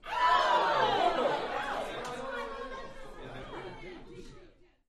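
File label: Crowd 15 Interior Rowdy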